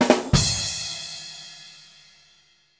TRUMVRV4

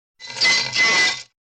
metal, gnissel; METALL

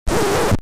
record, GRAMMOFONSKIVA; BRYTLJUD

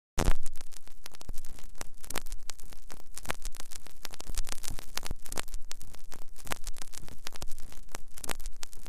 record, GRAMMOFONSKIVA; BRYTLJUD

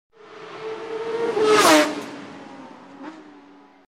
carby, racerbil

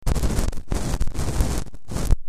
record, GRAMMOFONSKIVA; BRYTLJUD

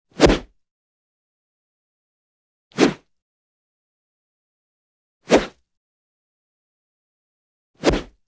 svisch medium x4; SMÅ

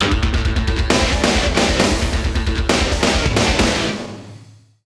HARDROCK

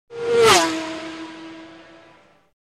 carby, racerbil

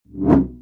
svisch; SMÅ

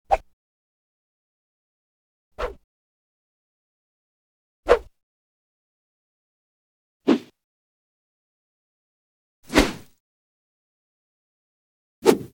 svisch small

